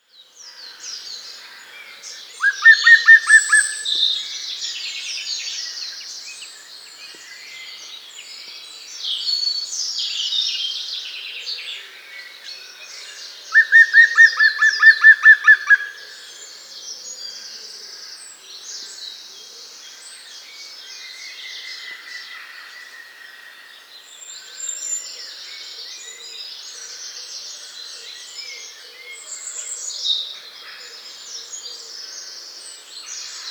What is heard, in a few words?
Green Woodpecker